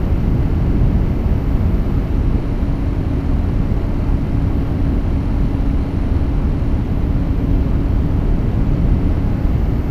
BOILER, AMB; ENVIRN-AMB

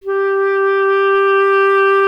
clarinet G, Solo Instruments; Miroslav Vitous - Solo Instruments VOL-1; 90 s Sample CDs

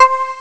Tubes, Amiga Soundtracker; ST-XX Amiga Soundtracker Sample Packs

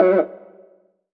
R CUICA LW, Drum Mashines; EdgeSounds; Drum Mashines VOL-1; R-100 DRUMS

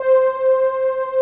SMOOTH SYN, synths; Pro-Rec - Synthsonic

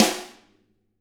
DW RIM, SNR; ILIO; Double Platinum Drums 1

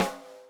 snare, Millenium MPS-400

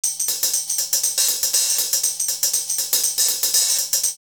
R8 Hit D Hihat 120, drumloops, DrumMachines; X-Static Goldmine

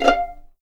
F5 PIZ MF, String; East West; The Ultimate String Collection

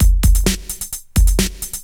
drum 130 electro, drumloops

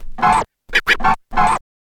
scratch kit, vinyl

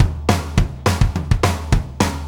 Tamla, Drum Tools Various Loops; Sonic Foundry (Sony Creative Software); Loops & Samples Drum Tools

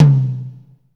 tom, Jazz; Northstar - Drumscapes Roland

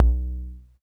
polyvox tom

